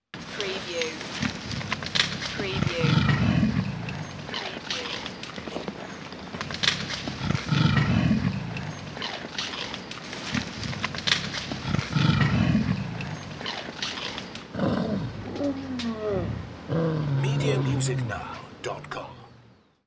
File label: Gorilla Eating Growling